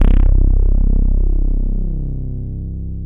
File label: TS 10, Analog, Synthesizers